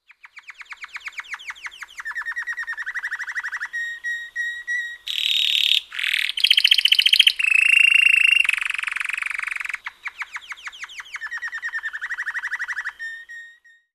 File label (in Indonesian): kenari seninger